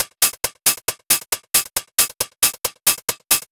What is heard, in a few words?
Hi-Hat Loop, Drums, Dance Beats